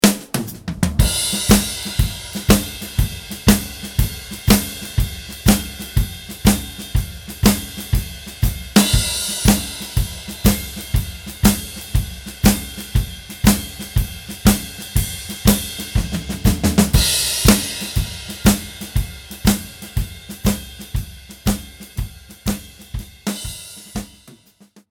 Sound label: Two Handed Texas Shuffle